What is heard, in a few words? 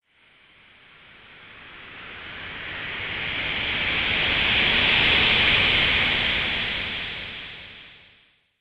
Astral Winds, Science Fiction Sound Effects